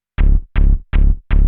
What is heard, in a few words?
bass, 160bpm; hous-tec; Magix Music Maker & Editor